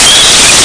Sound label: chicken; What PC? 1997 August; Audio Interchange File Format